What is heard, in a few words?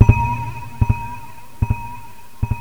aeolian harp